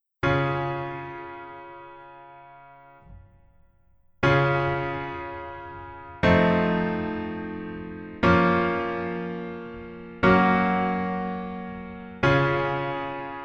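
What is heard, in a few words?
Piano version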